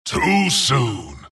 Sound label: death long; dota; skeleton king